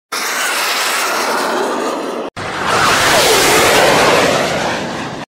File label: Ballistic missile; موشک بالستیک